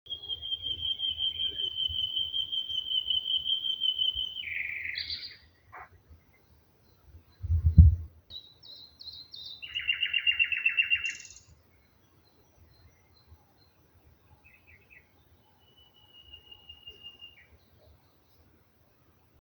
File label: nightingale birds spring; die weide lebt